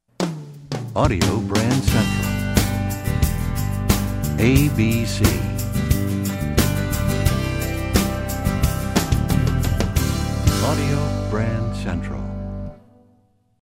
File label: Desert Wind, radio jingles; Audio Brand Central